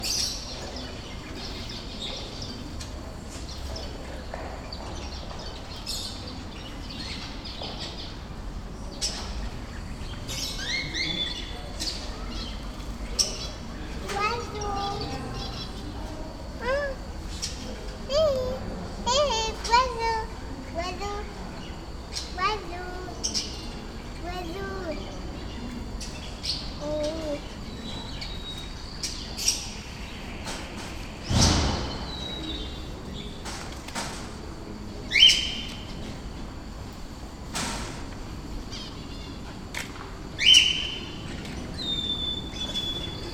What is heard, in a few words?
Birds Serres Auteuil